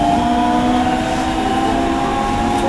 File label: step